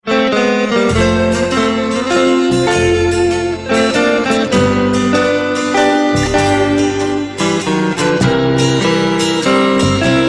Coco shaker

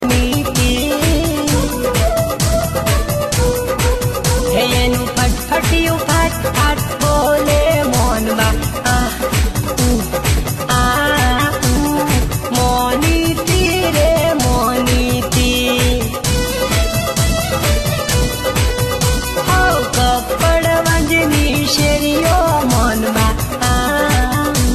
Garba Gujarati

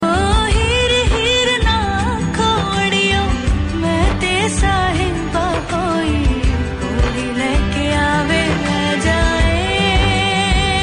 Punjabi

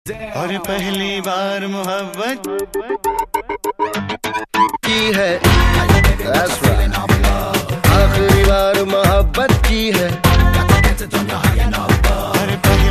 Love Tone

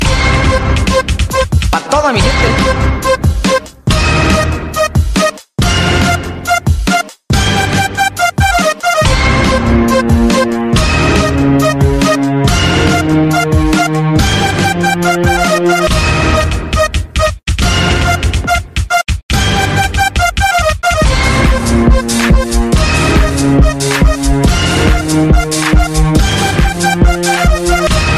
Crunk Mix

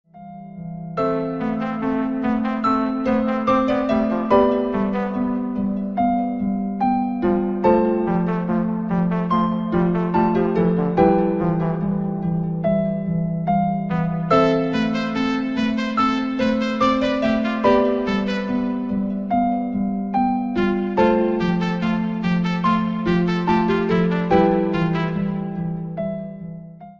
Beat

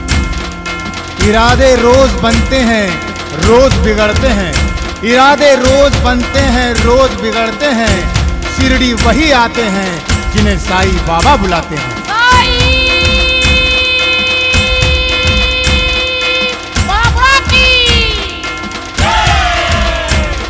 Sai Jai With Dhol

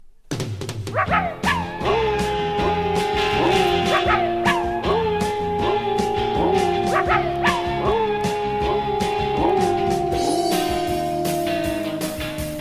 Puppie Singing

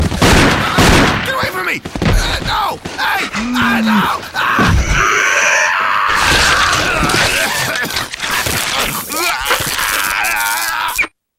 Zombie Meal

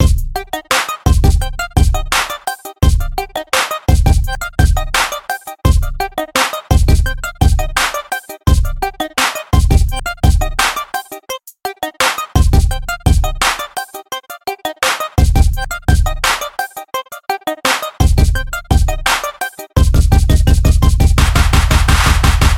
Club

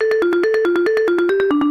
Simple Bell

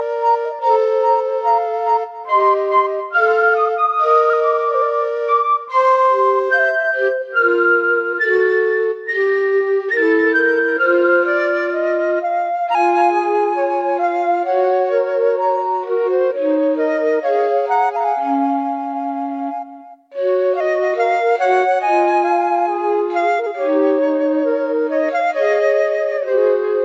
Sound Of Flute

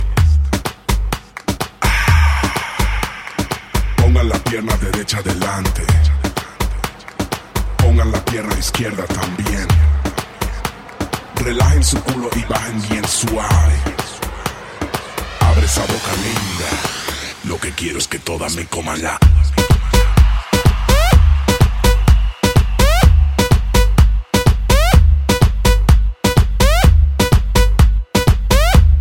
Remix

